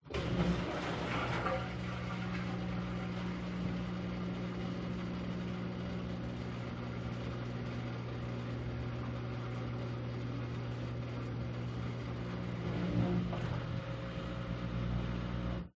washing machine engage drain valve with pump water released into drainage; motor runnin; omar alvarado